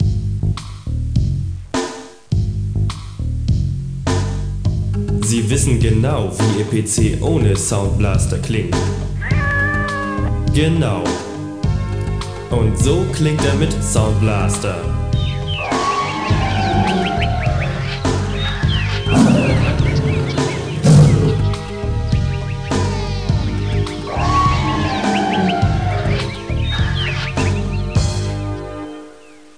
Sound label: jingle